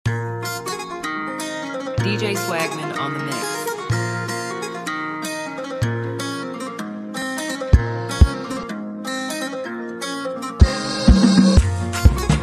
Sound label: Abokiano Mara Beat